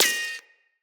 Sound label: Bullet Impact Ricochet